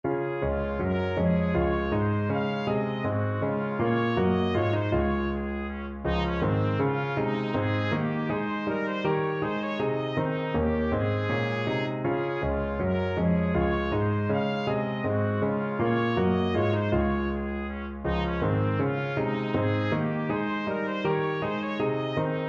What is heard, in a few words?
bouree, trumpet; handel water bouree